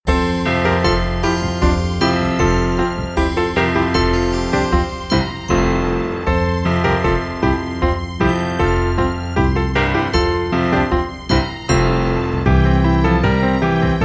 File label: deck the halls